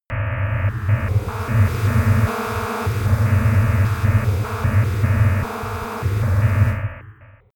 atmosphere on off

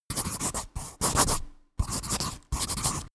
pencil writing

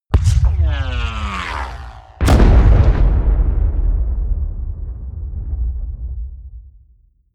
air strike

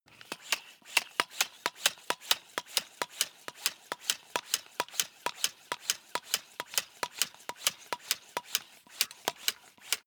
air pump small sequence fast

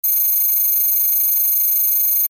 title running layer signal

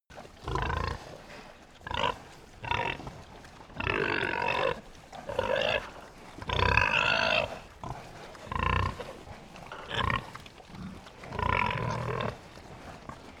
pig herd grunt, pig

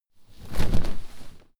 clothes harsh hit drop

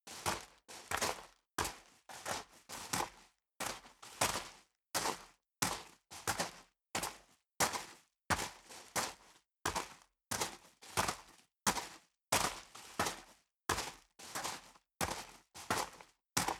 step boots gravel medium walk sequence

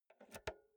insert jack multi socket

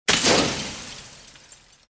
glass breaking

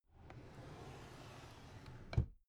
drawer cabinet carton close soft

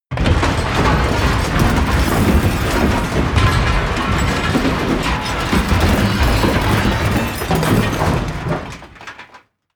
metal loud medium

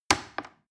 plastic button little click heavy slow

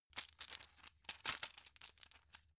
plastic blister handle long

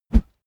whoosh bamboo slow

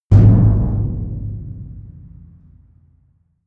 big metal impact low long

